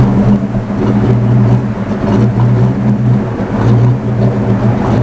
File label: pipes